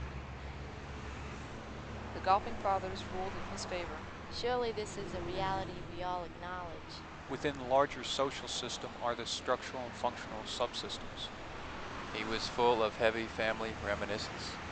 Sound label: noisy traffic; Noise Power Spectral Density Estimation